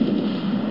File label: CANNON